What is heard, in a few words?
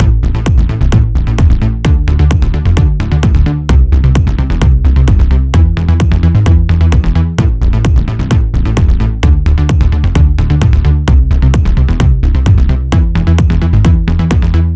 Phat Bass Line Kick Am 130 Bpm; Axel Wayn